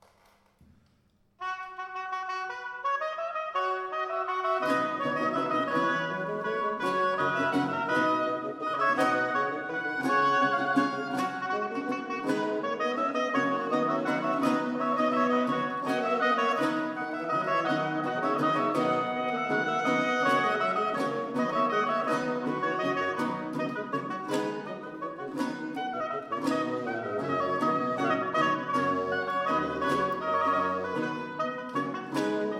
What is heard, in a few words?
Symphonie Militaire Fanfare; Purcell